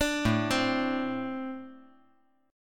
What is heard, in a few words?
A Minor Double Flat 5th A